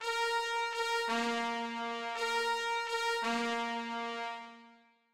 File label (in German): Hahn in Ruh